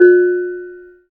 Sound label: BONANG F4; Spectrasonics; Heart of Asia